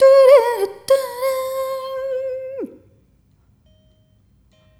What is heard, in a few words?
Rhythmic C